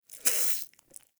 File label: Wheeze Close, Infested; Ambience; Warframe; Infested Eris